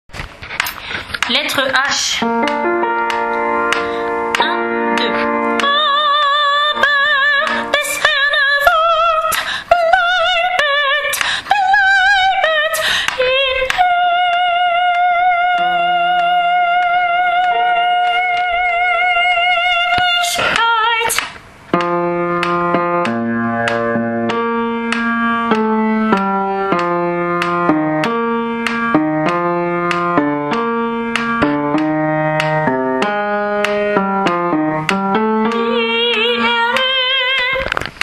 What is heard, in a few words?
Brahms requiem allemand soprano; lettre H